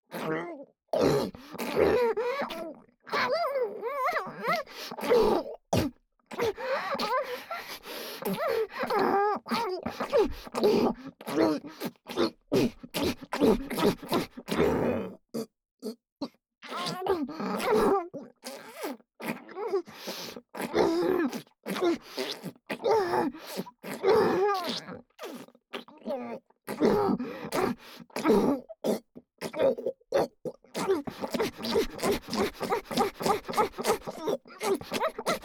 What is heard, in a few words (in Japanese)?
ツンデレ 喉奥口フェラ, ASMR; フェラ素材